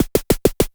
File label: Klone Dual Percussion Synthesiser KLONE SNARE